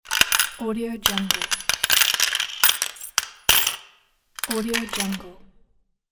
jiggle coins

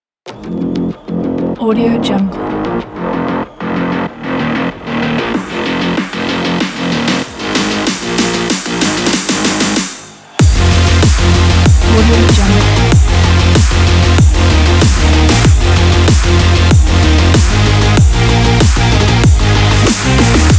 energetic electro sport trailer music

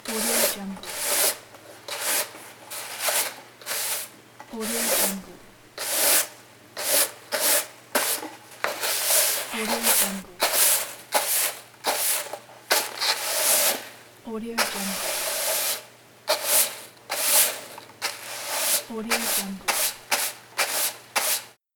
broom sweeping tile hard surface floor; kilmermedia

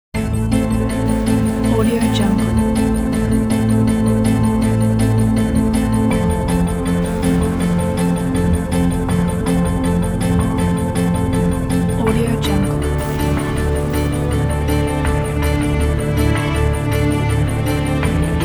dramatic epic emotion

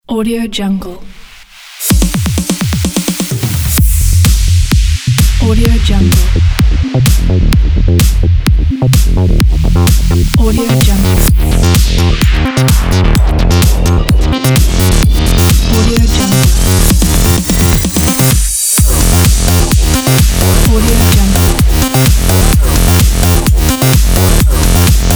electro house

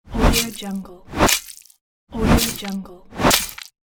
sword on flesh

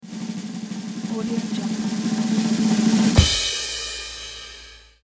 drum roll; bitmaker

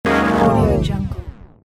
game lost; albasfx

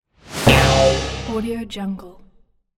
comedy strike

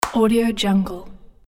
hand clap; ambientsfx

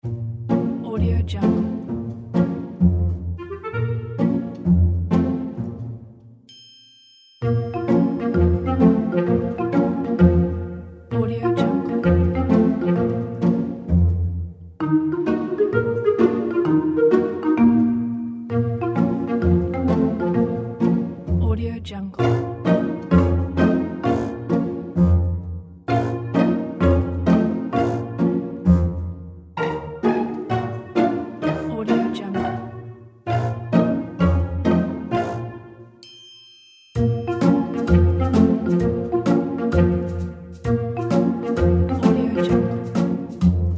funny melody

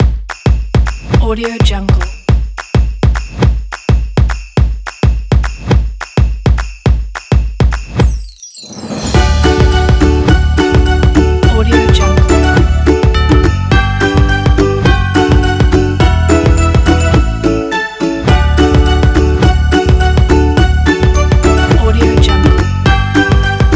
jingle bells

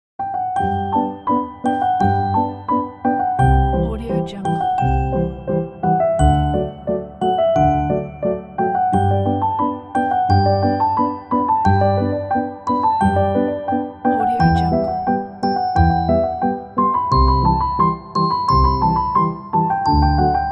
children dance

